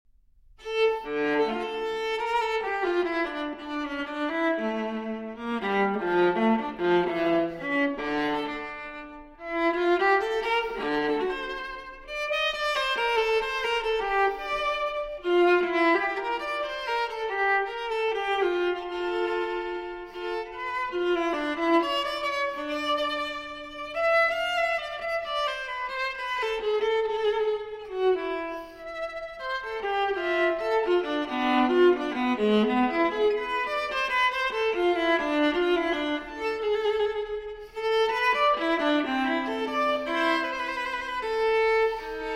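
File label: Cello Suite No. 2 in D Minor Transcription For Viola Allemande; J.S. Bach; BWV 1008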